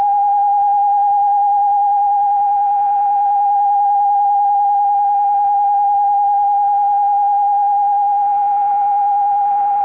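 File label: PICC MK VI idle, PICCOLO MK VI 6-TONE MFSK; Piccolo MK VI system in idle condition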